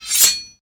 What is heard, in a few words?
M9 Draw